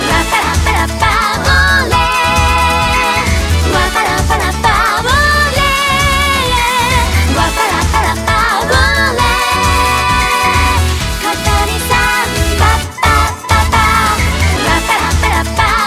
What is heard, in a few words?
Samba; nintendo 3ds home menu themes